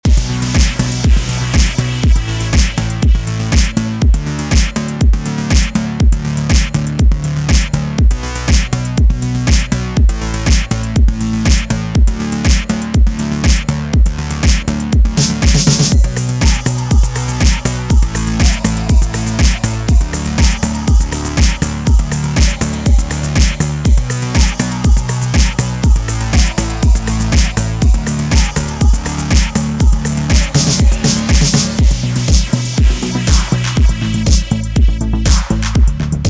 Trauma Instrumental Dub

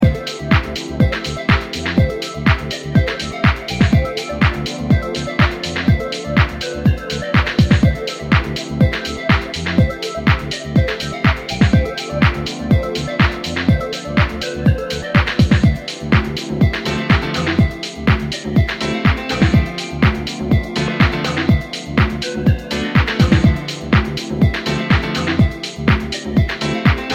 Piano Reprise

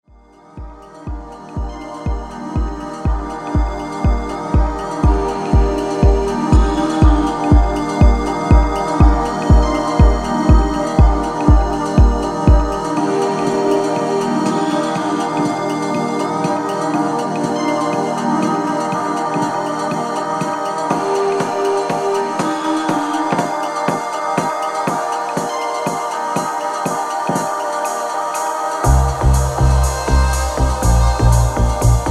flute track

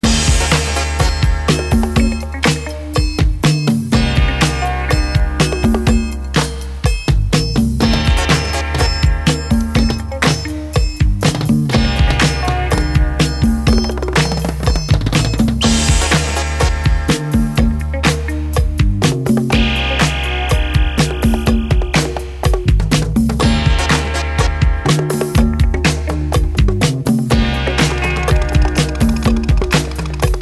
Percussion

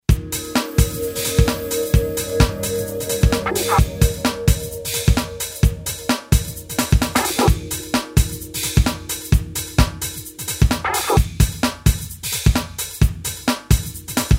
Piano

